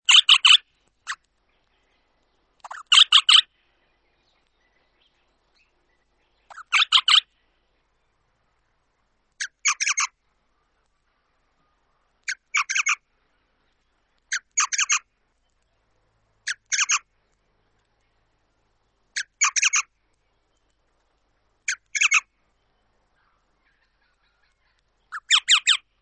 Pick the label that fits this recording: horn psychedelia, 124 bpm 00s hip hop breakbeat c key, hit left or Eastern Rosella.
Eastern Rosella